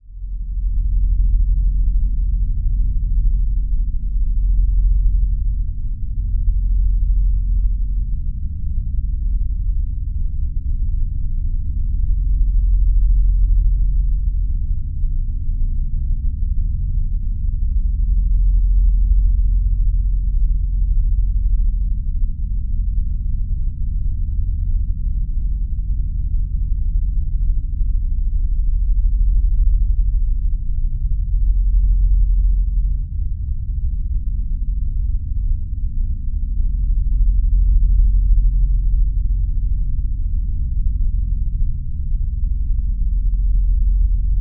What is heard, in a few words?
Drone Deep Synth Rumble Atonal; Production Element Imaging; AdobeCC